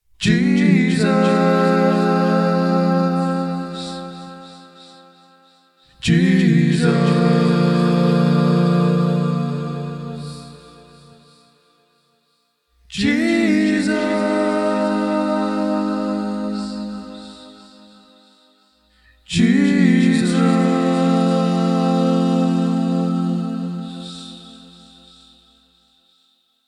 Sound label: Angelic Jesus Chords